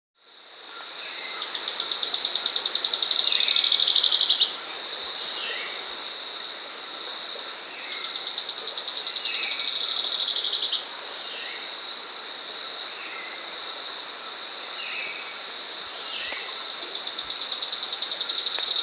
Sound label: Spot-Winged Antbird (Percnostola Leacostigma), Andean Birds; S. America